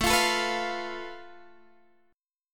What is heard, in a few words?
A Suspended 2nd Sharp 5th, Guitar, Standard; Asus2#5; strummed; x,12,15,14,12,13; JGuitar